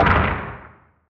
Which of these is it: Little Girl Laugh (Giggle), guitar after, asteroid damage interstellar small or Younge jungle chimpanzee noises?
asteroid damage interstellar small